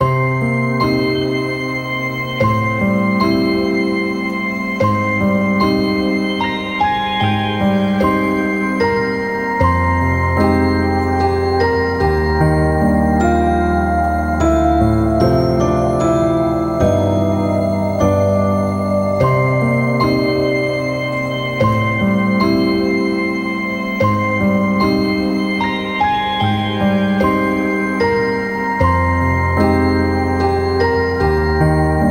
bgm field steam holy

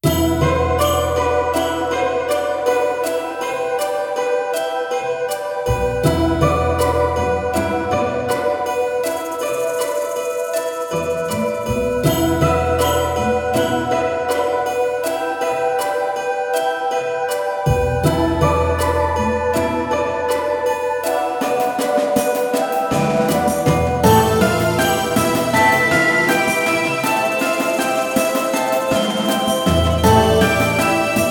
bgm dungeon general tower

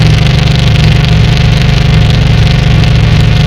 bike; Bgm event galiard

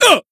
damaged, jp

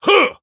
Voice attack

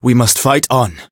healed

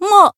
attack

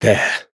Voice attack; ceries

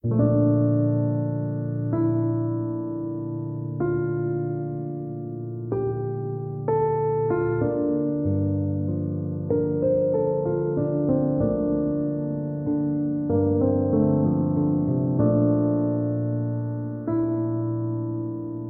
main theme piano

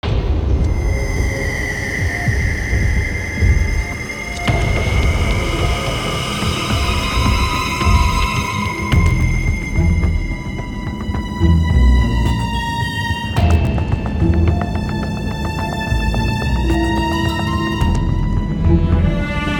Bgm dungeon ruins; land of ro

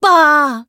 Voice attack; nagi

